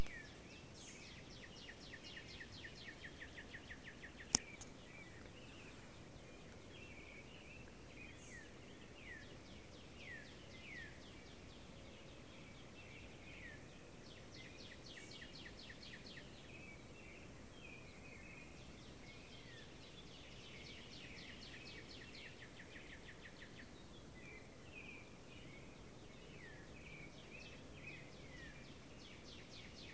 morning birdsong

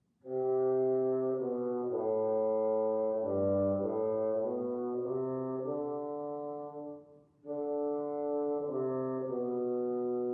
bassoon studies; julius weissenborn

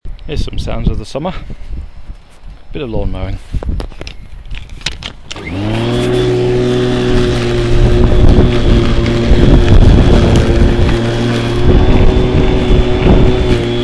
summer sounds

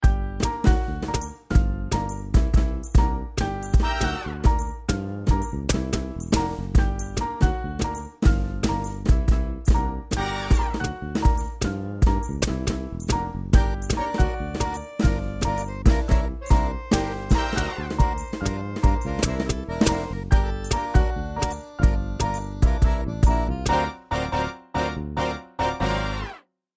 latin american

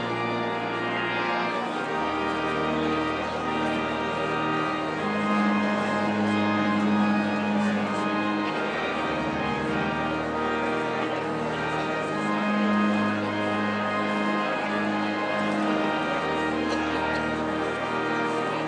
organ grinder; during intermission at lewisham pensioners day

